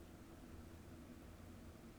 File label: pulses, BALAENOPTERA; MONACO 2022-07